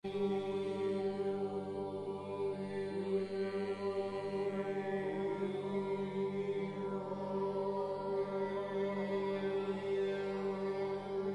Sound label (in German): obertonchor